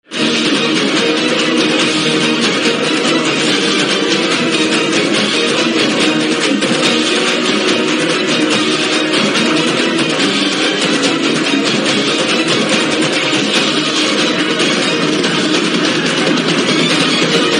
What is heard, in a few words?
Dramatic Music